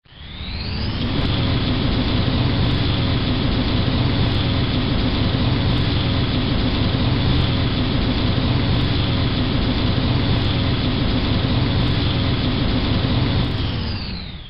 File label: hovercraft